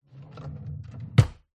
household wooden bed side table drawer close modern metal runners